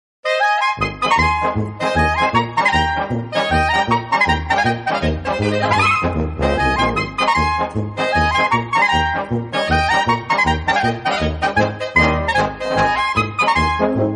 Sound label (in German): Schottisch, Kapelle; Feuerhäusl-Schottisch